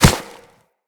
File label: Kubrow Step Snow, Footsteps